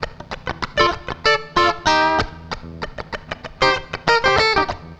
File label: FUNK, FUNKY GTR